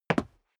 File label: step sneaker concrete single stair up; schritte